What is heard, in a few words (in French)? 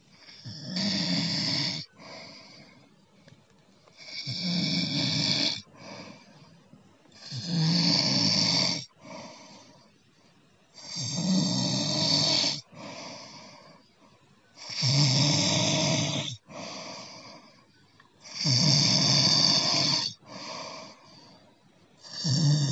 ronflements